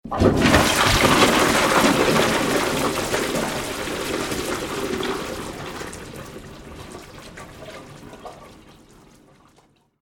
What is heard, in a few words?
Flushing Water Sound; Bathroom Sound Effects